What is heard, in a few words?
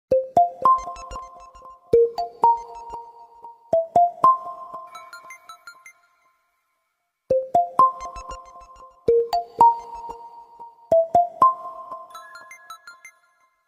whisper alarm